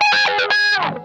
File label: OVER WAH, Funk Guitar; 90 s Sample CDs; Zero G